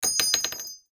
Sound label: cart